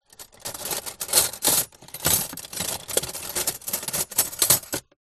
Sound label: household cutlery in cardboard box movement